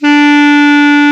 KLARINETT, Alpine Volksmusik; Best Service

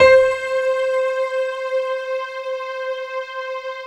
PIA, STRG; Best Service Gigapack 1

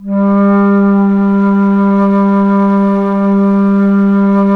ALTO G2, ALTO FLUTE; Historical Instruments; AKAI; USB Soundscan vol.23

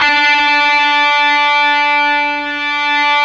GTR, Dirty, Buzz; Club-50 - Foundations Roland

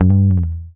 GTR TRIK, Jazzistic; Univers Sons

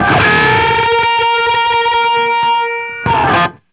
DOUBLE A#3, guitar; East West; Steve Stevens Guitar Samples Collection; DOUBLES

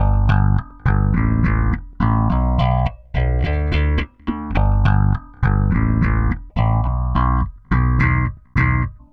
Bass, Indie Rock; Sonic Foundry (Sony Creative Software); Indie Rock Anthems

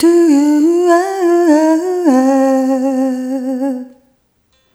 Rhythmic C

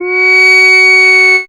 fx F#, Rave FX, Dance Synths

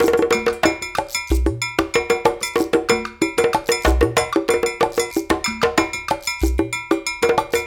93 Brk Dr Reggae; Spectrasonics; Supreme Beats; World Dance

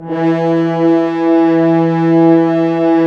BRS FHRNF, FHns Dry f; Roland L-CD702; F.Horns 2

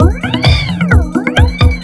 130 DISCO; USB Soundscan vol.20; Fresh Disco House I; AKAI; DISCO ADD

